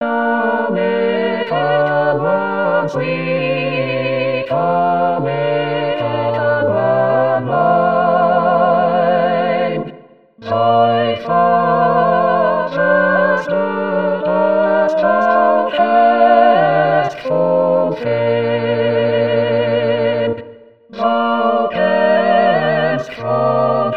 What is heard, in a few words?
O little one sweet All